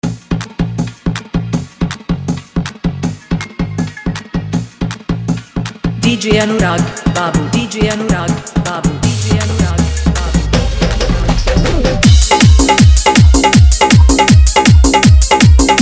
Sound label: Full Dhollki Bass Dance Mix